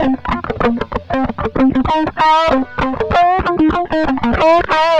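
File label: distorted guitar; USB Soundscan vol.31; Miro s Grooves; AKAI; DISTO GTR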